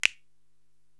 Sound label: Finger Snap; Korg TR-Rack